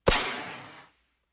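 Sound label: Rocket Flare Gun Firing, small rockets; Rocket Flare Gun: Firing Weakly. Dual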